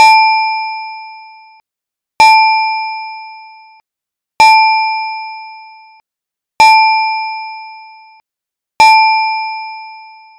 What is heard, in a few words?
bell rings